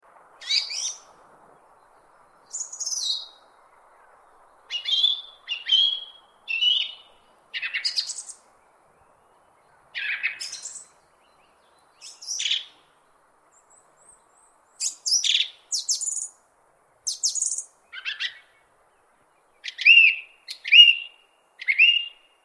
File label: bird chirping